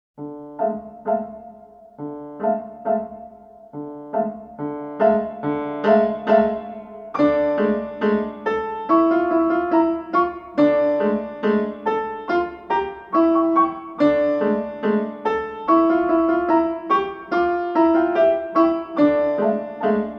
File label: piano